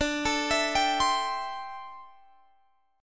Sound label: D 9th Suspended 4th D down Guitar Open G